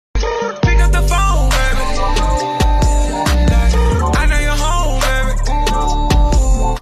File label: cat call